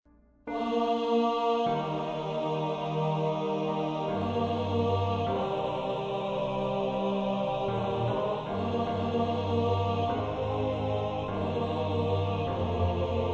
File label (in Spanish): Coro de peregrinos; tanhausser; richard wagner